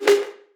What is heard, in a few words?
synth hard trap B